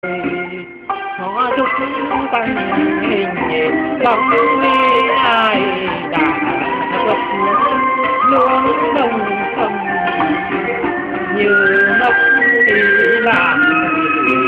Hát Chèo

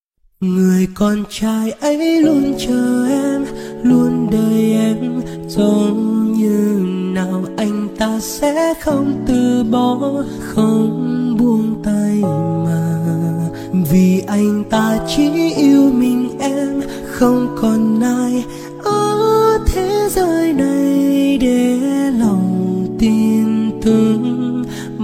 Nhạc Chuông

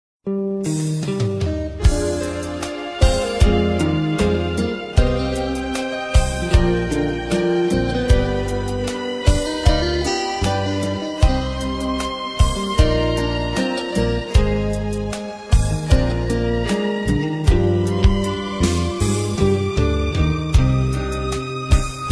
Liên Khúc Nhạc Sến Bolero

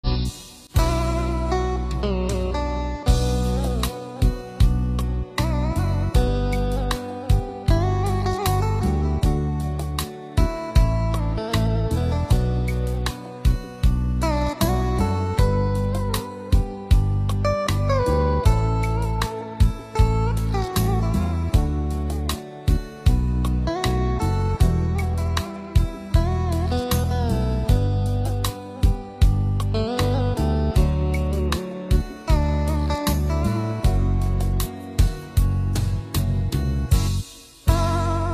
Rumba Bolero Không Lời Nhạc Sống

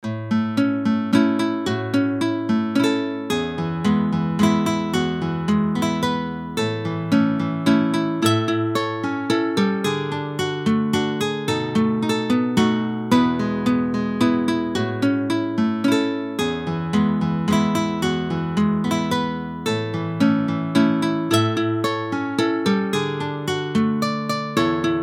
Happy Birthday, Hòa Tấu

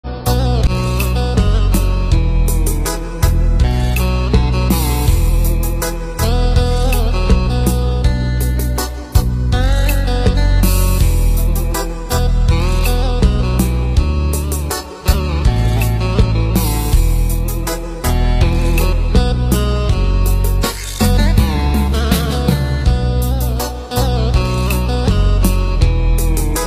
Hòa Tấu Rumba Không Lời; Buổi Sáng Dịu Êm Khi Nghe Nhạc Này

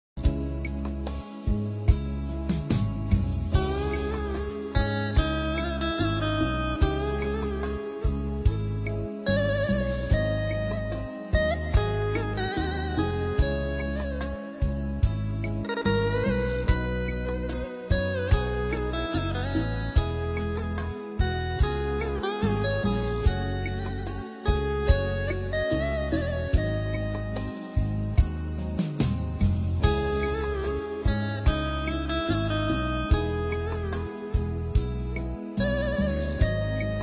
LK Nhạc Hòa Tấu Rumba Không Lời Nhạc Bolero Trữ Tình

